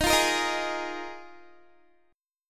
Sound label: D sharp Suspended 2nd Suspended 4th D sharp, Guitar; strummed; 4F,4A,5C,5G,5C,6E; chord